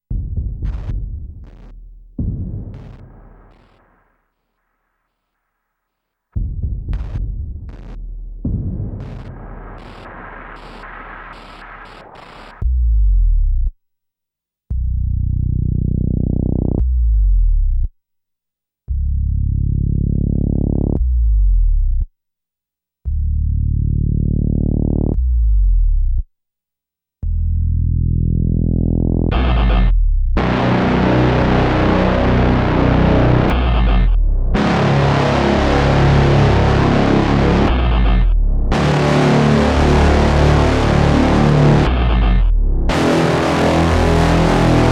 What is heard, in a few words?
Thermonuclear Clearer Orch No Drums